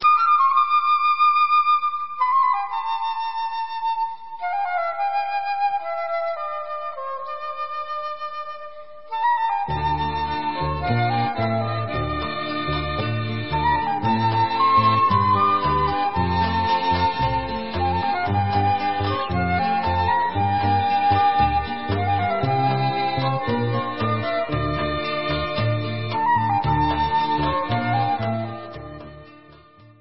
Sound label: Instrumental